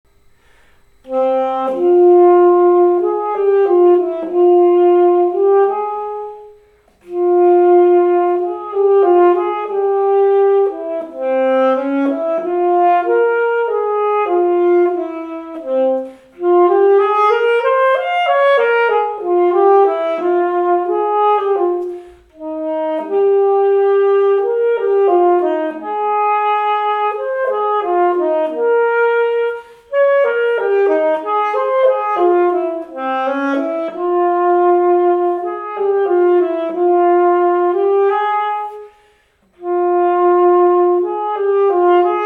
Alto Sax D minor; 8th grade all state

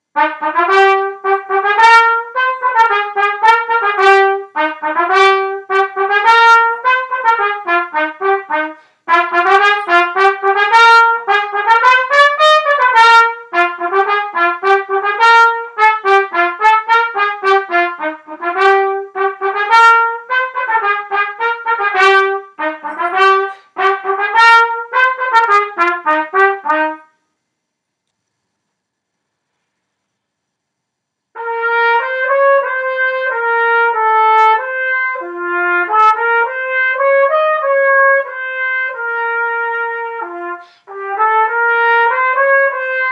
Trumpet Etudes; 8th Grade All State

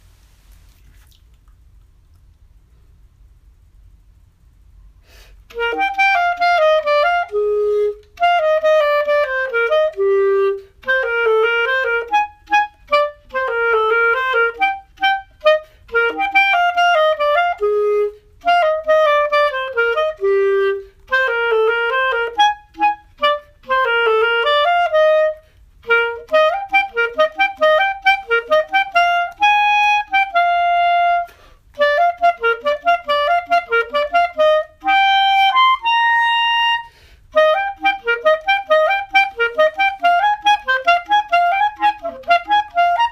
Clarinet Valse; 8th grade all state

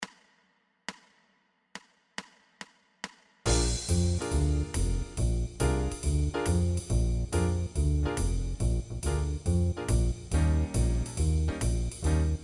Blues Bb; 8th grade all state

